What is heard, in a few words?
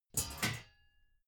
metal sheet crash light